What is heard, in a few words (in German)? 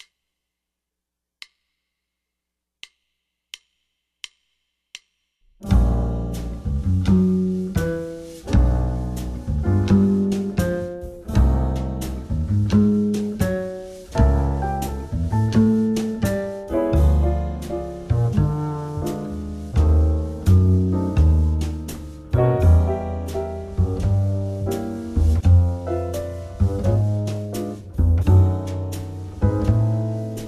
vermindert tenor, saxophone